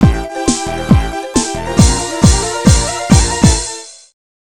Victory Theme; Super Puzzle Fighter II Turbo; arcade

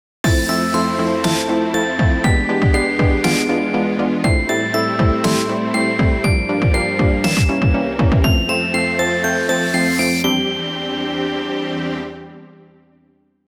Game Over; superlative night dreams cotton rock n roll

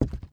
footstep wood

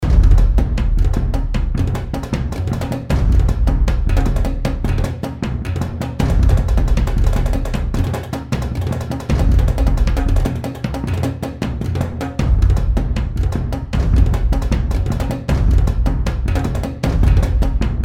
action drums middle short

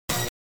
pickup tone

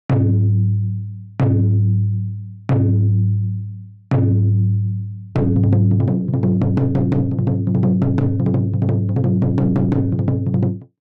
Taiko Drum Breakdown; the great ace attorney chronicles

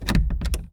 Metal Door Locked Try Open Slight Gap stereo; Don't Be Afraid The First Toy

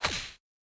Spawnin Land; boomerang fu

